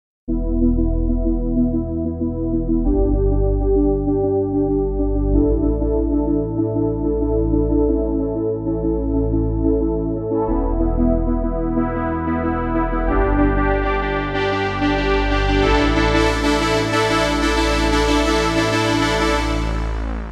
Prophet Like Analog

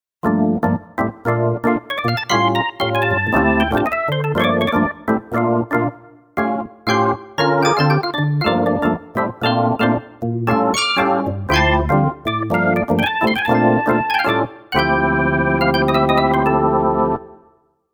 Bright Perc Organ

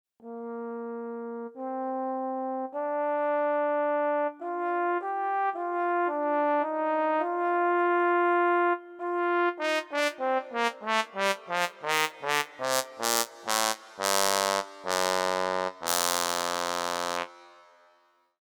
Trombone

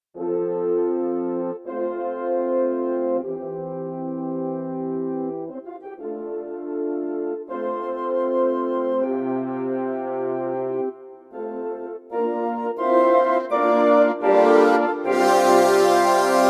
Orchestra Horns; Montage; brass

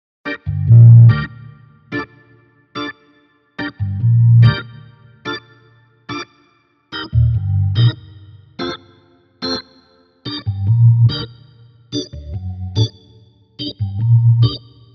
Gospel to Reggae, organ

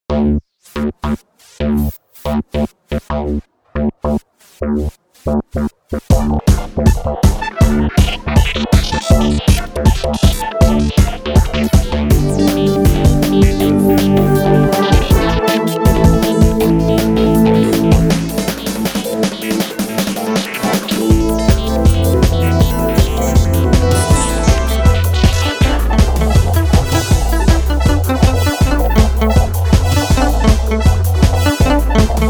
Rock ready; montage

